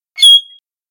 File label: iiwi call; Saddle Road Birding